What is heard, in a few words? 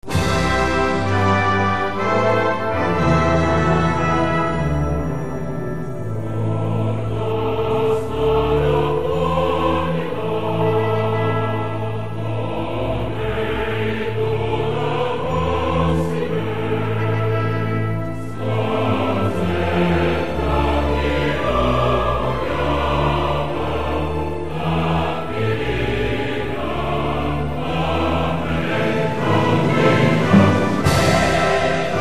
hymn